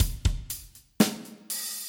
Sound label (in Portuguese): RITMO